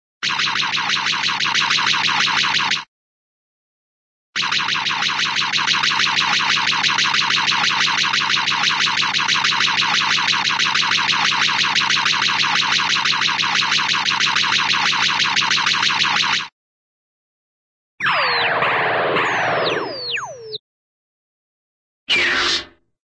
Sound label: sci fi nose cannon, short; cartoon